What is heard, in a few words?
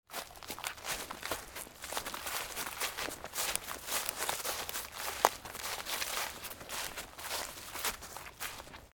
walking through leaves